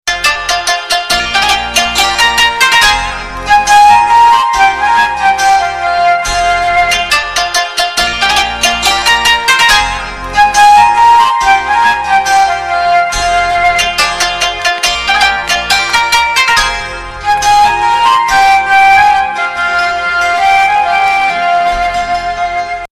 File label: vivah - music